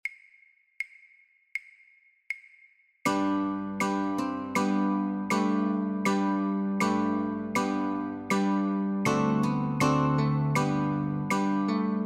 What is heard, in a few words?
Notes & Chords, Guitar; christmas